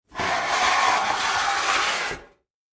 Industry Sheet Metal Scrape Metal Surface; Factories; Backgrounds

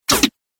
Robot Strike Attack; Robot Sound Effects

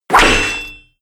Great Axe Hits Chainmail, battle; Battle Sound Effects

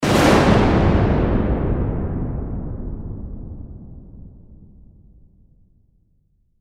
Lightning Bolt Explosion Blast Large Thunder; Weather; Lightning Sound Effects; Blastwave

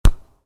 Soccer Ball: Header The Ball; Football; Sports

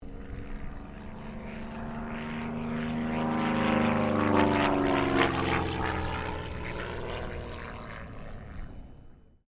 AIRCRAFT CESSNA 195 PASS; Airplanes

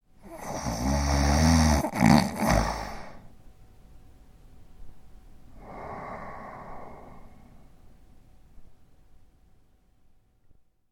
Snoring Male Snore; Snoring Sound Effects; human sound effects; LYN116 package of human sound effects

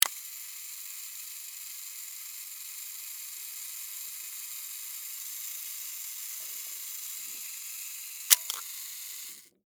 Camera Timer; Cameras; Electronics; BlastWave

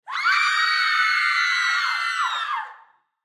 Human Crowd Studio; Crowd Reactions Female Scream Ahh; Loud; Blastwave

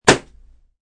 A ballon pops; Pops (Sound Effects:Objects)

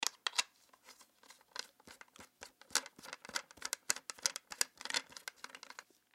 Pool Screw Cue, Pool Tighten Pool Cue Stick Screw; Billiards; SPORTS; Blastwave

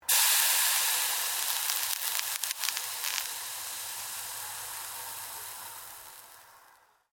Water Sizzling Hot Pan; Heated water sounds; Kitchen; Household

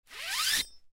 Machete Put In Sheath Quickly; Knife; Knives; Combat; Warfare; Blastwave

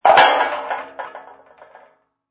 Impact Metal; Metal impact, hit with rattle follow-on. Metal can or object being thrown onto other metal.

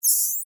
Insect Voice Scream, Vocalization, Bug; Animal

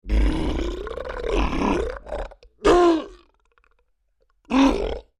Creature Large Grunts Pain; Monsters; Sound Effects:Horror; Big Room Sound

